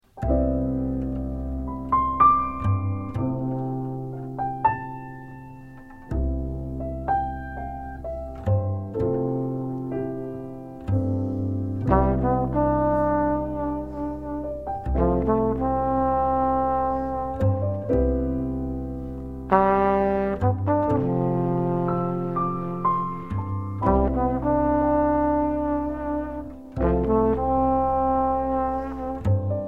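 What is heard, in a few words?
flugelhorn muted trumpet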